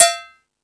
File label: Percussion, Drum Machines E-MU Pro-Cussion